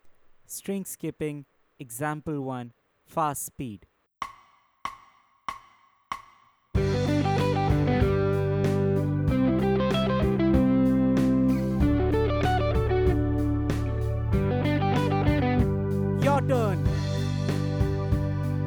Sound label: String Skipping Playthrough Fast Speed, lead guitar; string skipping arpeggios; The Art of Lead Guitar